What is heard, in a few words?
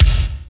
KICK, Tekno Industrial; East West